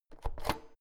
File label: oven knob press in hard